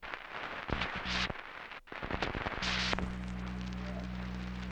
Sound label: recording noise; monroe